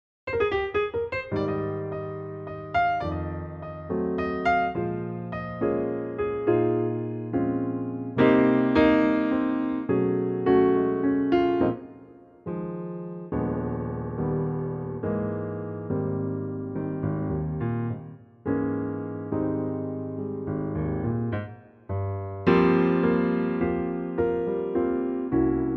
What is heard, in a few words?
Ab piano; Gorgeous piano only arrangement; key - Ab - vocal range - Bb to Db